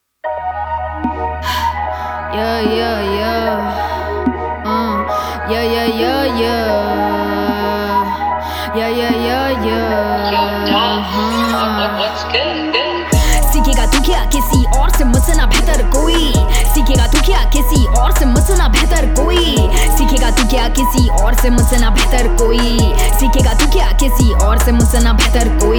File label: Rap Battle; Hustle 2.0